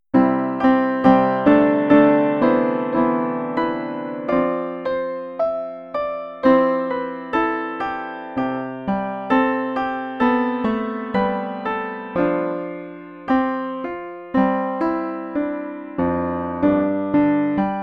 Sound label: Upright Piano; PianoV; ClassicU3